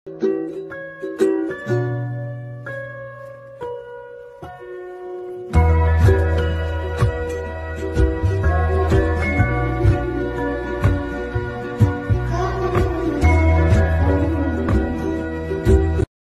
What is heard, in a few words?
BGM ringtone